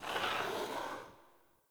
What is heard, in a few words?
Ur Piece Move; UI; Minigames